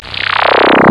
rippler down, electronic sounds; Sound Effects for Multimedia - Volume 1

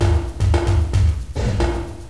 Drum loop; MacAttack

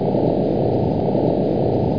machine, ambient; Quake; PainKeep

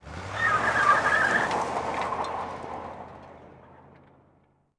peel out, crime

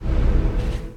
Ring In; XMenII; X2 Desktop Themes

